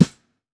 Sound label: Birch Brush, snare; Drums; Ghosthack - Free Snares 2023